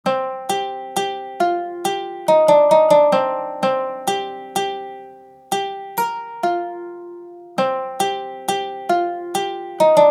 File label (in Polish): Kitara